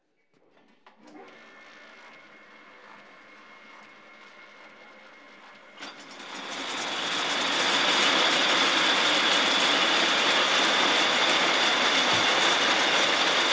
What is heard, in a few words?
spinning frame